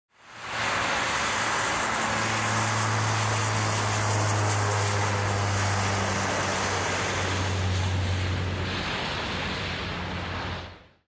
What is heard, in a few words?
Lockheed C-130 Hercules